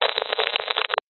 science fiction radio signal interference burst

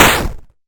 multimedia retro game gun shot

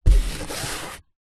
car hand or body part hit rub ceiling roof

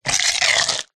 horror creature single bite

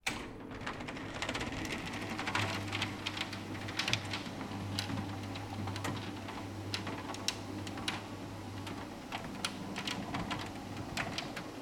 door grille security electric open

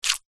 horror knife pull from flesh body fast; zapsplat

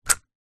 leisure fishing reel bail close; zapsplat

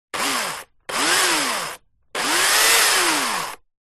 industrial chainsaw electric 3x short bursts air

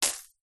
industrial chain small drop to ground; zapsplat

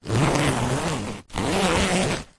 foley tent bag zipper; zapsplat

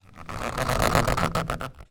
household furniture hand rub squeak polished wood

